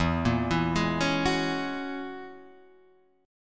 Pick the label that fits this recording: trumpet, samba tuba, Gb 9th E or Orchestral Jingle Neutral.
Gb 9th E